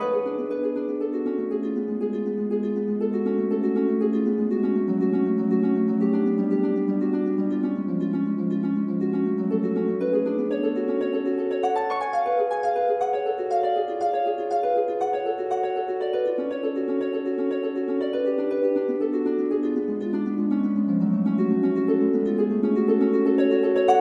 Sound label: harp; playful waltz